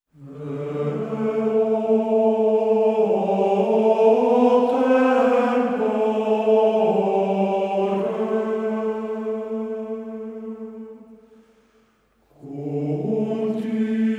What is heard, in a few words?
Chant; Genso Suikoden II Original Sound Track Vol. 2